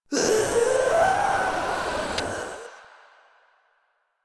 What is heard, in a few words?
Death; Warframe; Proto Infested Runner